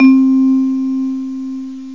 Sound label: Vibes